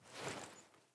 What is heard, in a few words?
Foley Action Default Block Remove; Player